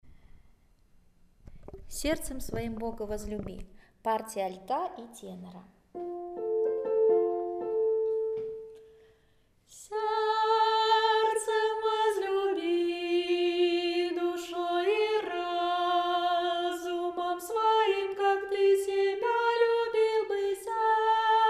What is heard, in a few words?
alt i tenor; Serdcem svoim Boga vozljubi